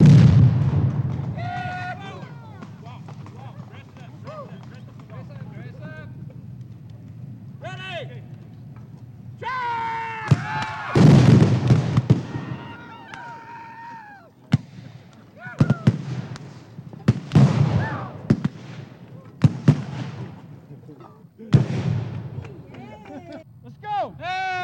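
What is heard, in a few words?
Civil War Battle Background Loop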